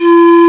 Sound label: Pan Flute E3; Multisamples; Digital Sound Factory Planet Earth